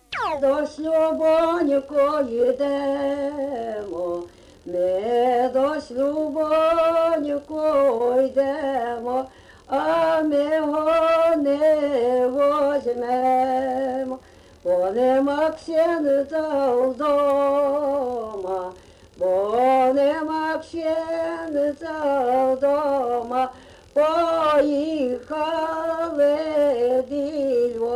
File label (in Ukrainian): vesilna do shlubonku idemo